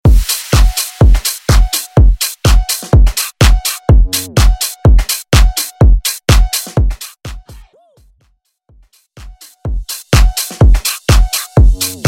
Bass House Edit

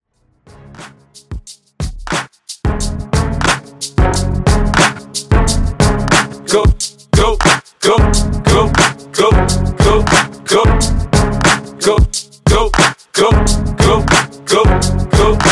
Intro, 90Bpm

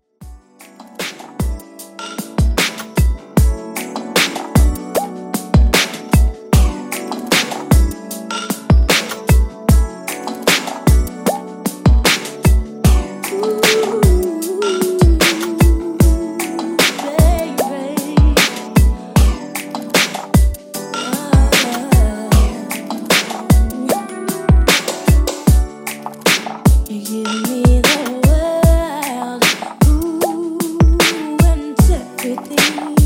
90s Extended Redrum 152Bpm

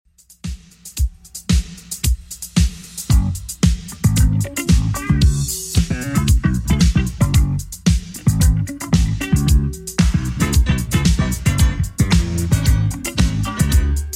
113 bpm; 80s Redrum

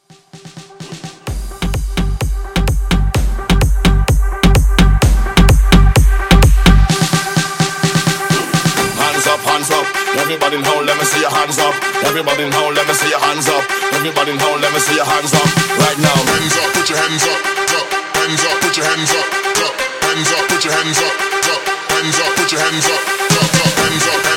Jungle 128

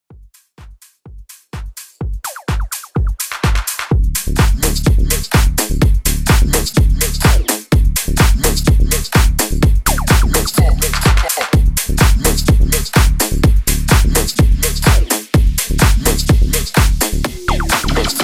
HYPE 126 BPM

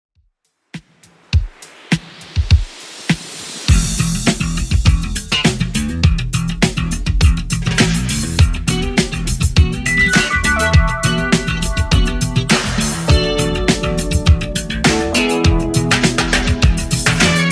80s Redrum 102bpm

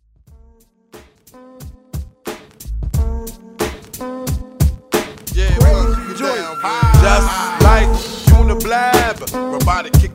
Old School Redrum

